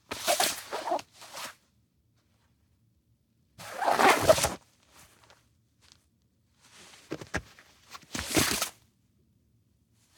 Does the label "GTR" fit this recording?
No